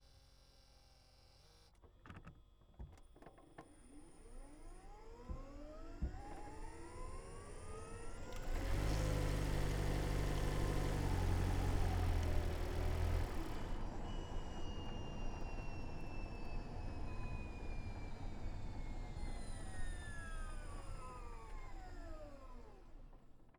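toyota c hr onboard start medium drive short stop engine center; Toyota C-HR Hybrid 2017; MKH8020; Pole Position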